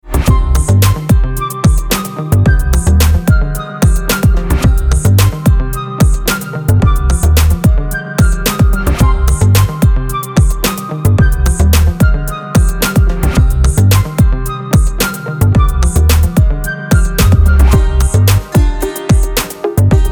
funny background dance music for short video and vlog